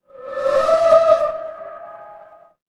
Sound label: transition whoosh